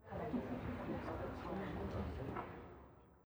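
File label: ambience generic sounds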